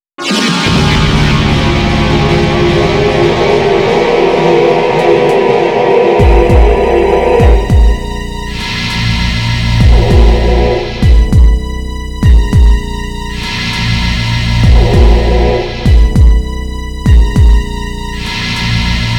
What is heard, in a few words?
Shock; Clock Tower 20th Anniversary Sound Collection